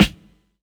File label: short reverb snare f sharp key